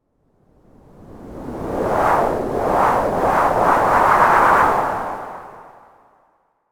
whoosh Tournant

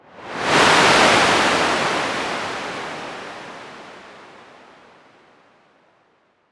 wave

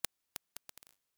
Pattern Bounce

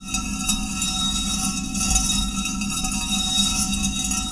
Rotate

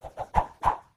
stick synth

